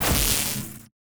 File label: sfx research station lightening; Stellaris; nemesis